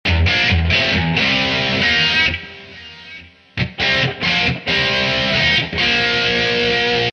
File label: C 138 rhythm